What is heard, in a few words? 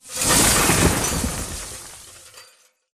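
Battery Crate Explosion Debris Sizzle; Titanfall 2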